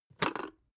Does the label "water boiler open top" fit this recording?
Yes